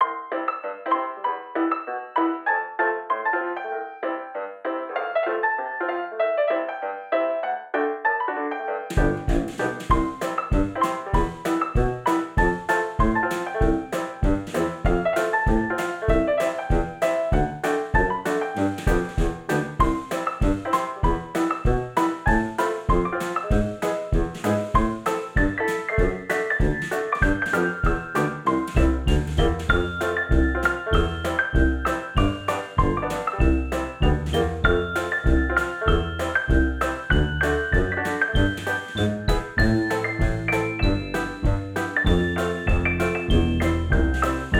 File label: Victory; Mowin' Throwin'; ps4